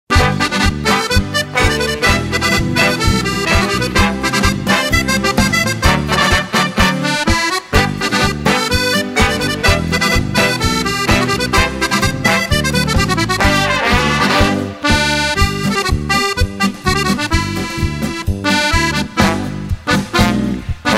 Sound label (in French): accordeon de nuit